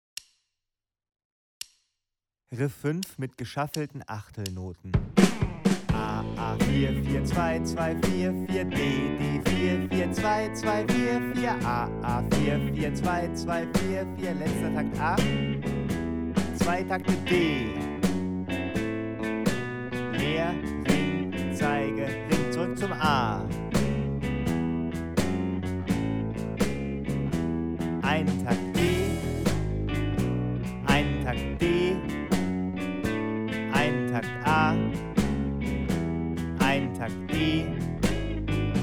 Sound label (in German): Riff als Shuffle Playback, Bluesgitarre; Shuffle Achtelnoten